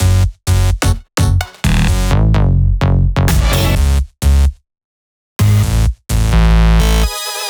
Sunday Bass 2 Root F#, 128BPM; Vengeance Samples; Sunday Kit

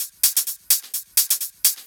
Electro Loop 128BPM; Vengeance Samples

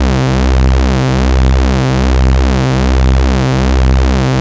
Ultimate Bass Root C; Vengeance Samples

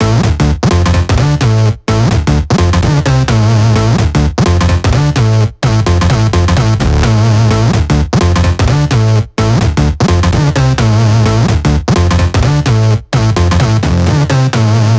128BPM Melody; Vengeance Samples; VFH1 128BPM Wanted Kit

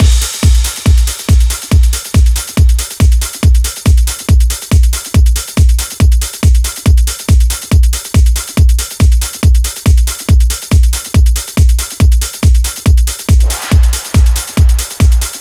Drum Mix 140BPM 16 bars; Gminor; Vengeance Samples; VTS1 Devastating Truth Kit

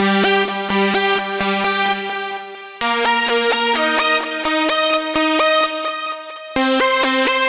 Female Analog String, 128 BPM; Vengeance Samples; Vocal-Hook Kits; Song Kit; Freaky Boys

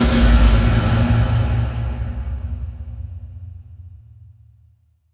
Hits & Explode

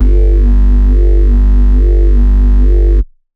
Bassline; Ghosthack

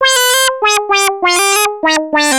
ARP Odyssey, Arp A Line, synth samples

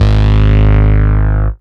Bass, Root G#; Vengeance Samples

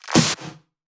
Pre-Shifted Claps; Vengeance Samples

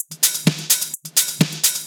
House loops 128BPM; Vengeance Samples

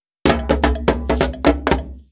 Fill 128 BPM; Vengeance Samples; VEH1 Loops

